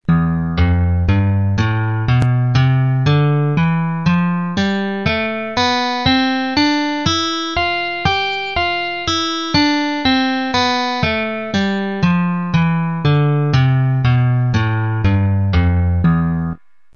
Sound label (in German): Tonleiter